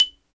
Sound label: XYLO, Afro Xylophn